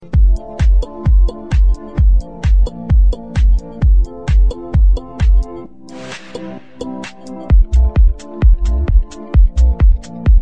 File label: Bass Beat